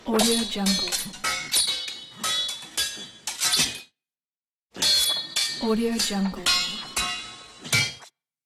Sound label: sword fight